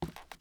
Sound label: wood step